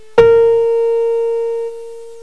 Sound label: PIANO